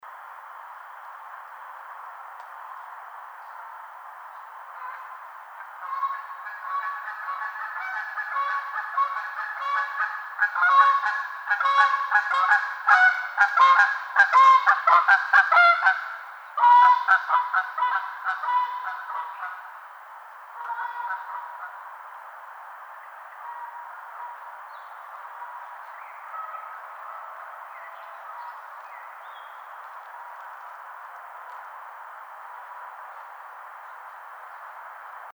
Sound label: Canada Goose